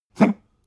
pug gag breathe short; natur-tiere; hunde

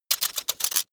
sci-fi electromechanics tiny voltage spark

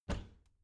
bathroom cabinet doors open heavy; objekte; tueren

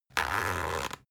zipper run; objekte; reissverschluss

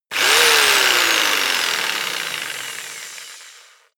jigsaw engine fast short; maschinen; saege; soundpool

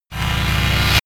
short distorted organ, risers

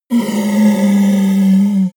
yeti groan long dying; kreaturen

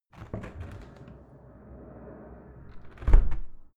window open flight flyby close soft; objekte; fenster

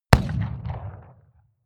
revolver shot 44cal remington 100m; schusswaffen

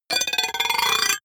rattle metal can hollow; bewegung

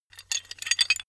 metal handling pieces

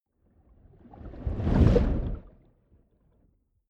whoosh abstract soft

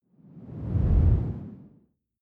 whoosh simple long low; swooshes-swishes

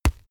boxing gloves parry punch; sport freizeit; boxen

